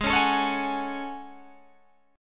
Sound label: A Major 13th, guitar